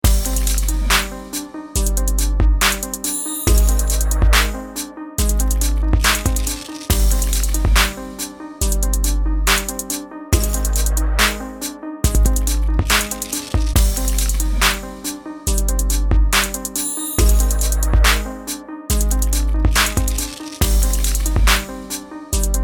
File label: Fuzz